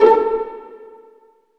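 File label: STR PIZZ, Violins Pizz; Vls Pizz; Roland L-CD702; Vlns Pizz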